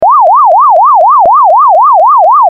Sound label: Siren